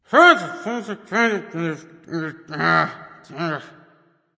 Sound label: grumbling; erlangshen